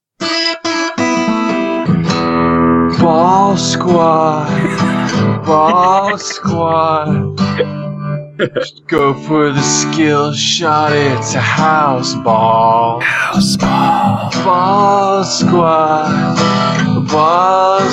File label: Acoustic; Ball Squad